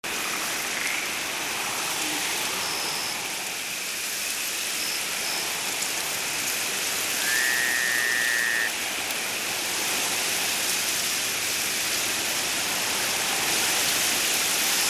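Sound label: AMBIENCE BED Forest Night Leaves Insects loop; gamerip; The Unfinished Swan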